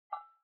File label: elements water dabble single glas bowl; smartsoundfx